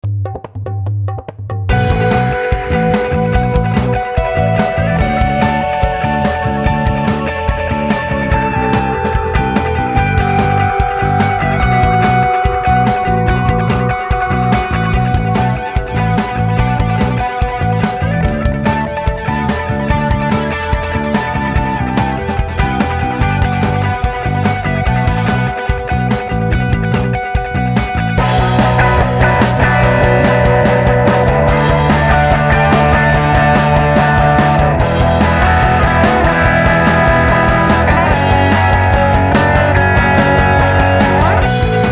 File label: Pop Rock; Cues for Film and TV